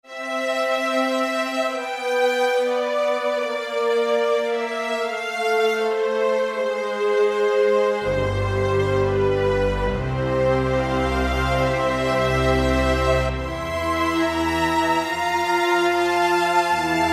String Orchestra, Strings And Orchestral Percussion; Roland L-CDX-04 Strings And Orchestral Percussion